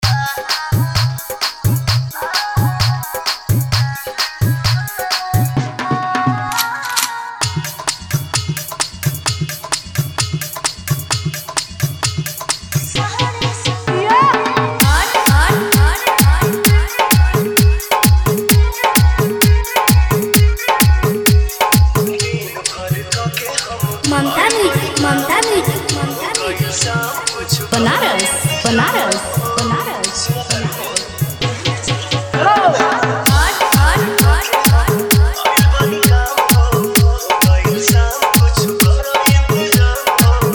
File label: New Bhojpuri Dj Remix Song